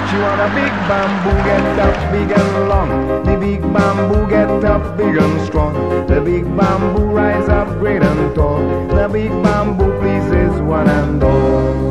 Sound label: Ringtones